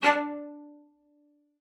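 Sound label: cello spiccato D5, cello; Xsample VOL-5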